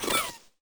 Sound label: critter hit by flare; nightmare criters